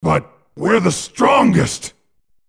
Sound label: death; gogeta